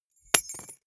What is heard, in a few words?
key ring small drop carpet hard